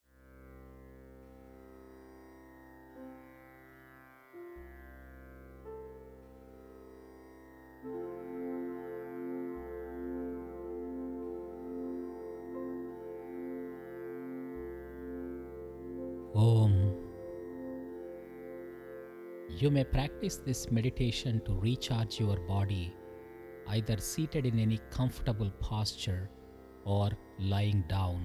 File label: Mantra Healing